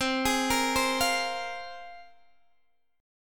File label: C 7th Suspended 4th Sharp 5th, Guitar, Open D